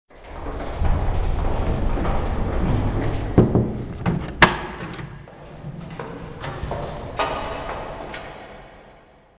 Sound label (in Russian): shagi